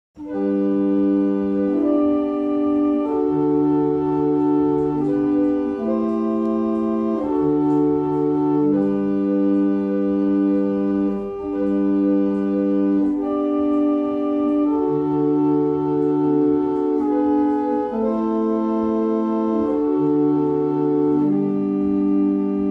German Hymn, barrel organ; Cardeston

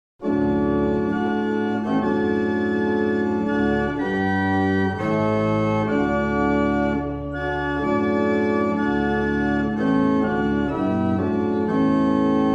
Truro, barrel organs; Mattishall

